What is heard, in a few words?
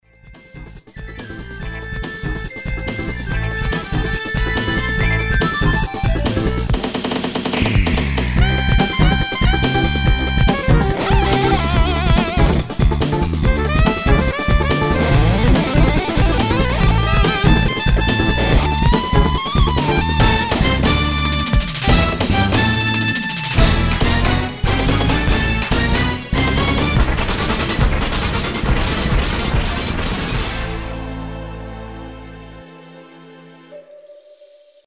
guitar